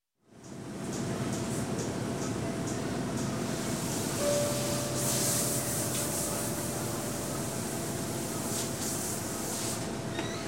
Dry Cleaning Plant General Ambience In Dry Cleaning; Sound Ideas 2000 Series